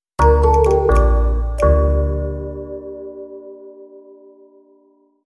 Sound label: Castanets